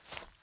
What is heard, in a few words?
foliage step; teardown ps5 windows xbox series xs gamerip 2022